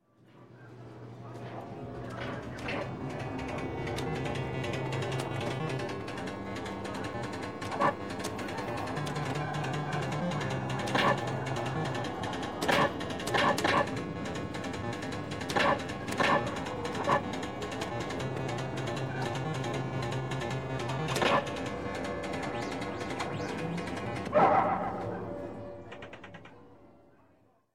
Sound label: Arcade, Video Game Close Up On One Game, Others; Sound Ideas 2000 Series